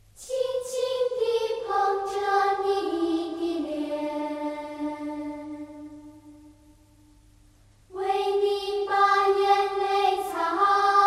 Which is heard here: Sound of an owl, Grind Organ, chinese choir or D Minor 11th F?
chinese choir